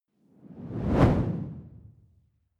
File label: whoosh simple hard low